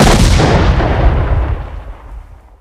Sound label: rgd5 explode, weapons